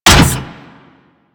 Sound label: Step Metal; Warframe; machines; Jackal